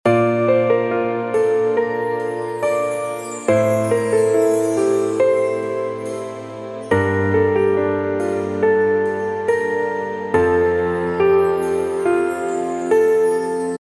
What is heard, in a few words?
Tender Piano Loop